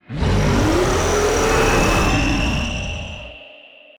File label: WAR POWERUP; HUMBABA; Mob